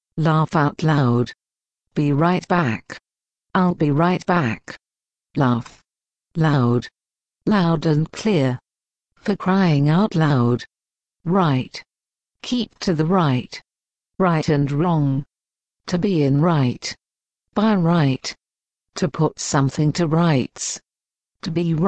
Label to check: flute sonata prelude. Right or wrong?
Wrong